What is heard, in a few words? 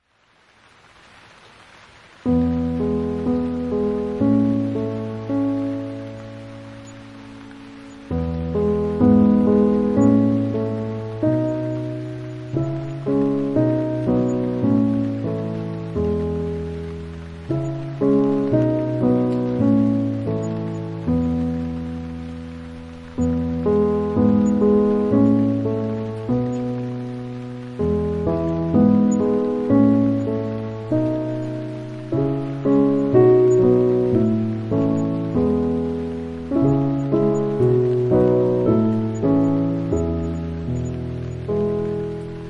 Calming Piano Music with Rain